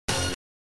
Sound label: pickup tone